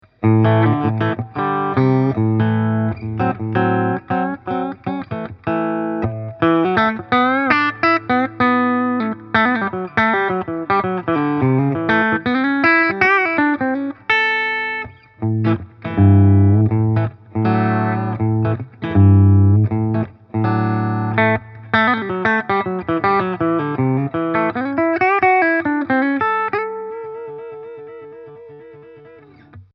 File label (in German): Harley Benton SC Custom III Active Steg clean